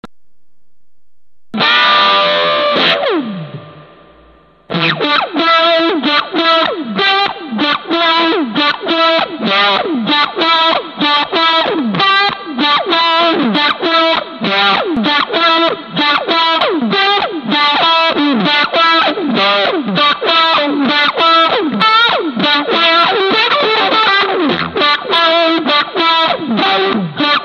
Groove; Tube Zipper

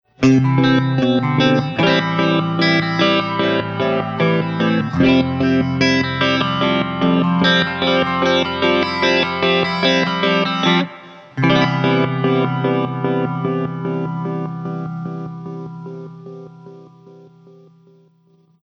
CHOPPER delay but isn't; GFX

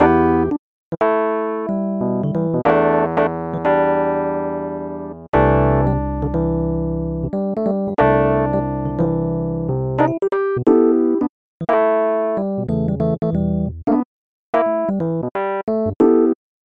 Rhodes

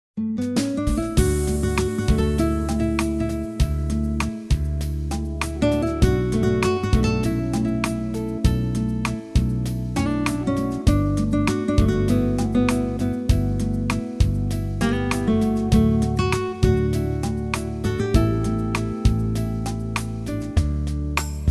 Pop Beat

